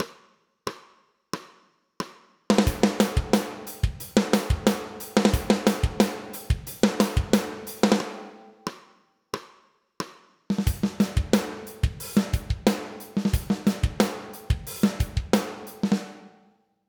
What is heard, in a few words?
DRUMS DYNAMICS